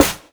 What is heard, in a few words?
studio snare drum c sharp key